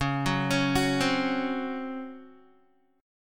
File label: Db Major 7th Suspended 4th Db down Guitar; arpeggiated